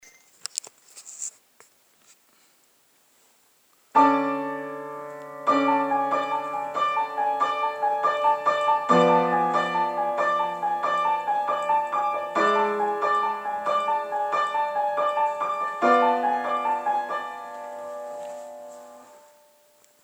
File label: Piano Lick